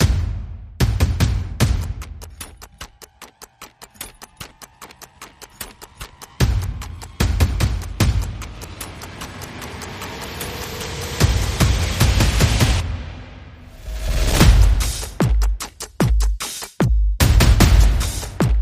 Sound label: Percussion and Effects